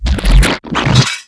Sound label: SITDOWN